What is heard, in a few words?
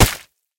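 shot hitting soft target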